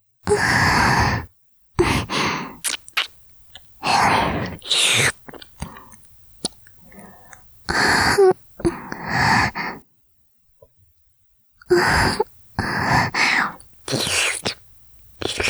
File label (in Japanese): 耳舐め; ＳＥなし